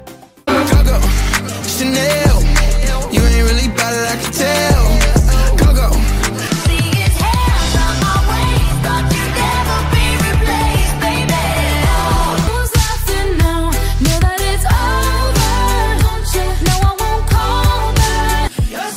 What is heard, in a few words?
new english music ringtone